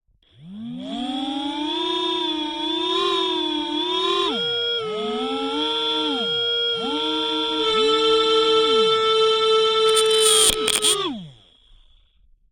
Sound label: Quadcopter Contact Mic Rotors Run Various RPMs Scrape Mic; Audio Shade; Quadcopter Flight